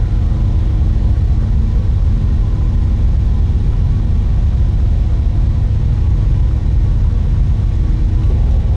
amb engine A; Star Wars Knights Of The Old Republic II; XBOX

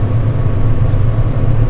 hangar; ambience; narshaddaa

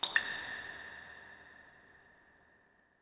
drip; portland

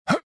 jump; jedi

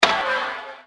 open, doors pipe; movers; Soldier Of Fortune II Double Helix; XBOX

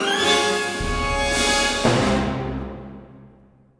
battle win, music

